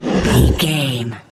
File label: troll monster effort growl small